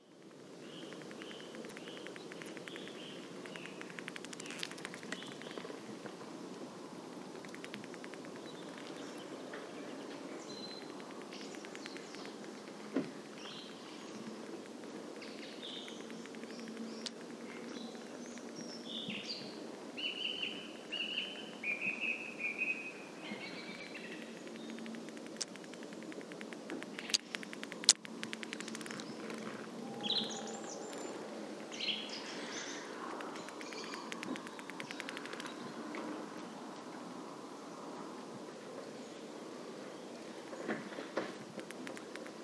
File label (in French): chauve souris